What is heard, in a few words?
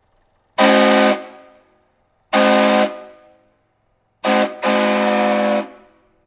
whistle